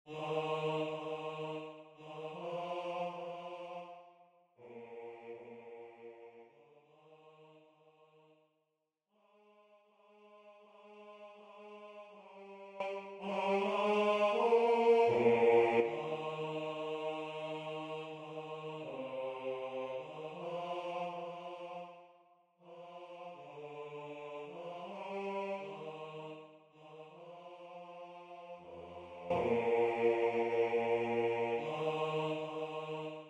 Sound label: Lascia Basse